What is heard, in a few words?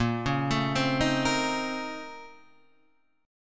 A sharp Minor Major 11th, A sharp, down, Guitar, Standard; arpeggiated